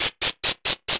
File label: Dungeon Stairwell Top Ascending; Open Zelda; Quest Designer 2.0.4b